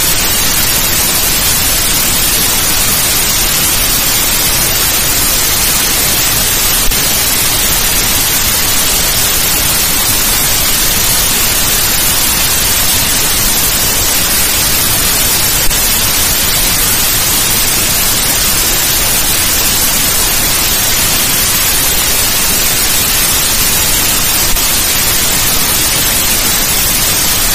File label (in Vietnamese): Tieng may hut bui